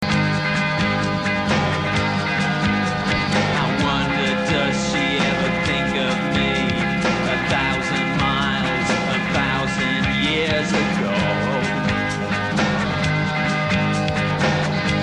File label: distant trains